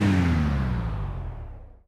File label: stop, Pod